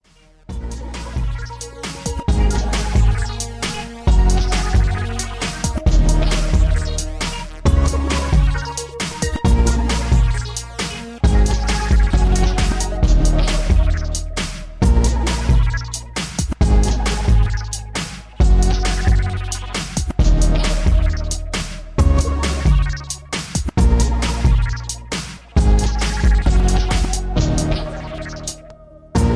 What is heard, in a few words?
67BPM